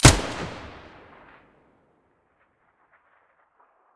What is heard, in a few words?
vihr muzzle stereo, weapons